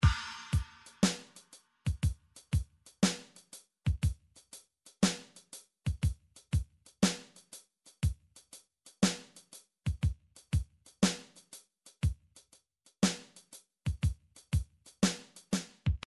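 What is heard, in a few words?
midi drums half shuffle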